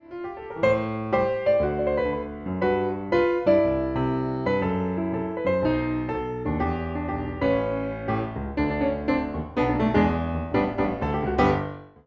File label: Piano